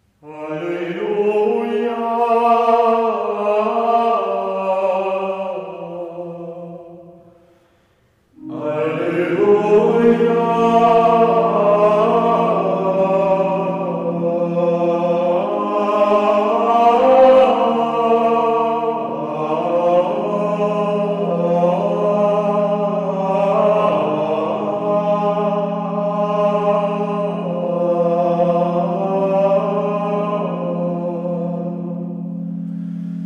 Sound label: Alleluia SOLO; misa Dia; Dic 25 Natividad